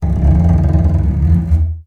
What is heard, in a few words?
TALK BOW, BASS FINGER; Xsample VOL-5